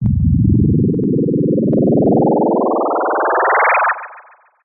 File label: synth rocket clock robot lower wobble power up; Jessey Drake